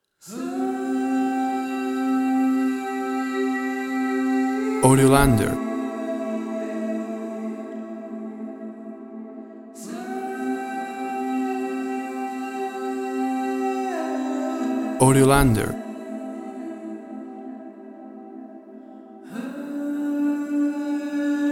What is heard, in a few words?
Vocal Sea Soundscape; Relaxing and reflecti